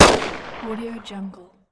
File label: gun shot long echo